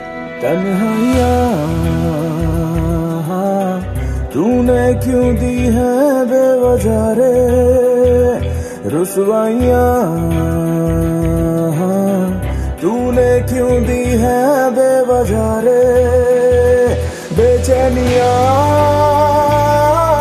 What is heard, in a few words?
Sad Bollywood Ringtone